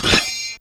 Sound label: Rnch Bell A4, Fairlight CMI IIe Bells